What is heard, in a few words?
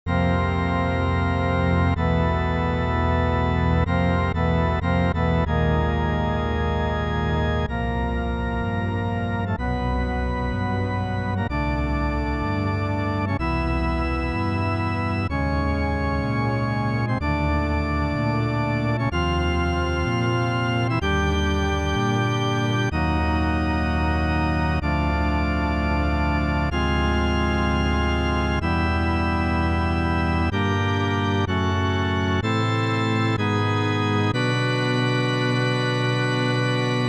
Organ Theme; Grand Poo World 2; SNES; TLOZ - Ocarina of Time - Ganon's Organ Theme